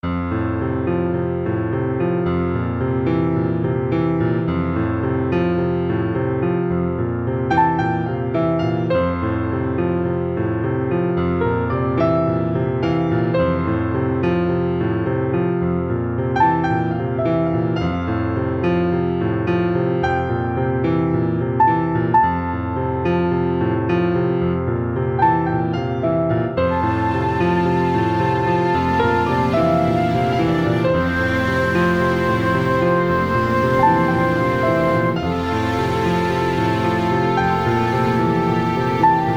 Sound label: Piano Theme